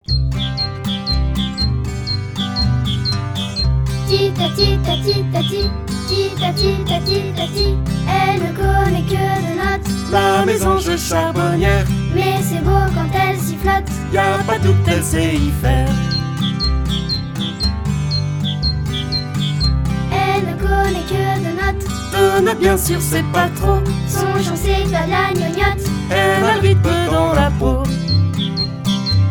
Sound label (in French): La charbonniere; cuicui et compagnie